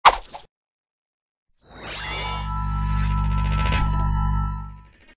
small magnet; Toontown Online

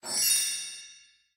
pairing match; toontown-ceiling

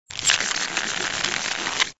rolodex; Toontown Online

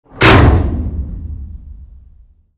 drop safe miss; Toontown Online

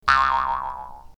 bounce

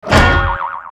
train cog; Toontown Online

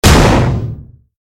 gavel; toontown classic

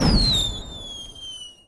firework whistle

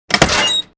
door open

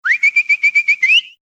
call pet

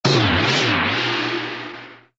settle; Toontown Online

